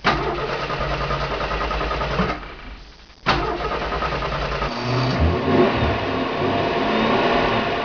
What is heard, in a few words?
car start; 10,000 Graphics Pack Volume 1; Media Graphics International